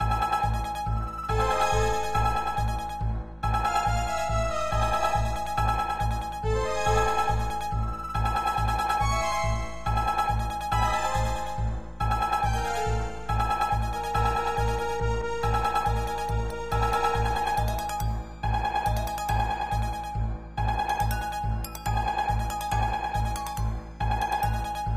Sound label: Negative; MapleShrine